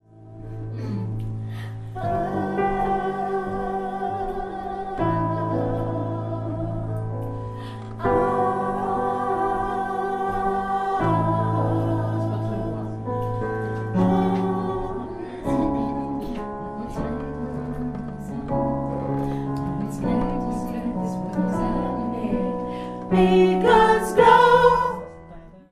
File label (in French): pont nappe soprano, gospel